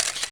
shutter cont; LG SH400 Alibi

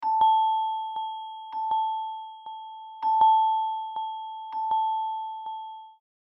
Crystal Echo, ringtones Calm; Samsung Galaxy S22

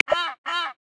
Nazca Booby; Galapagos

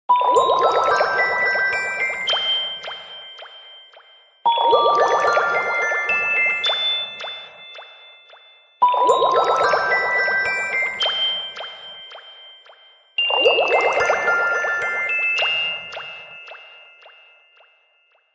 Water life, ringtones; Lenovo; A630

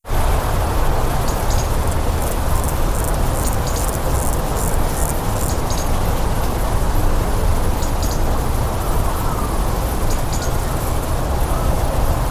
Woodland Ambiance; Mudita Pure; bell; bg sounds

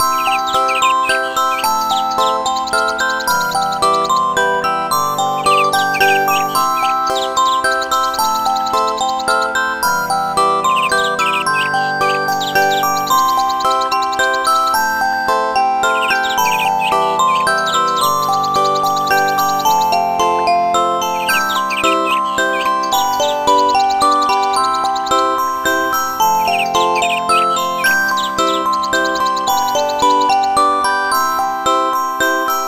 Morning Bell, ringtones

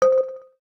electric, keytone

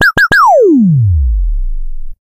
lazer, notifications; Sony; Xperia Z3

